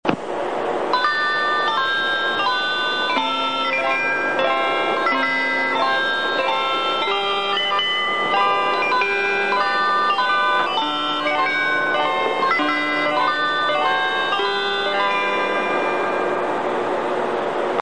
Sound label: train chime; きらきらうえつ; ウイーンの森の物語; 「きらきらうえつ」で使用される４８５系のチャイムです。